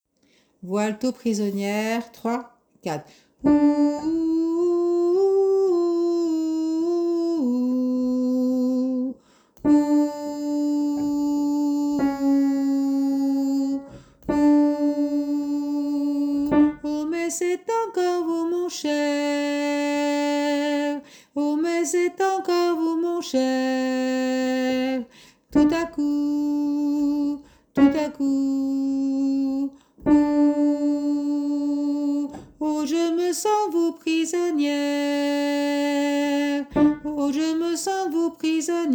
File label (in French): voix alto